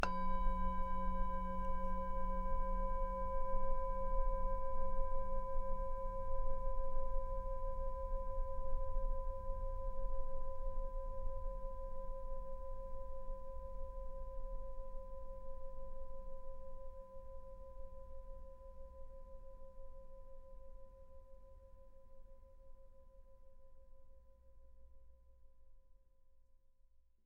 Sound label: large bowl; bowls